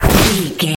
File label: nature spell bush tree whip